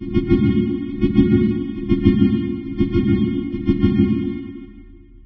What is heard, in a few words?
citadel 5 sirens; ambient; source engine; episodic